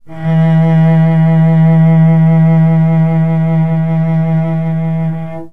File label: slow vibrato, cello